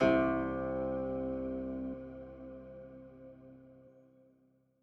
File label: ETHNIC BAD KOTO